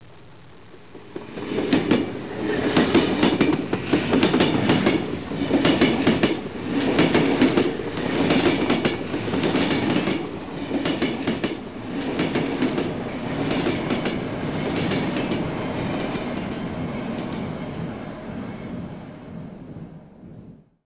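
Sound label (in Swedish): tunnelbana arrival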